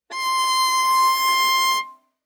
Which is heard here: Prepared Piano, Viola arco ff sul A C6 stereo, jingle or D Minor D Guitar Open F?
Viola arco ff sul A C6 stereo